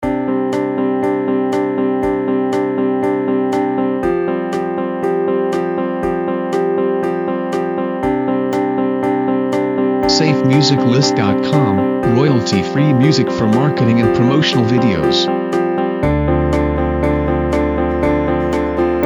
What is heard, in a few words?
Corporate Work; SafeMusicList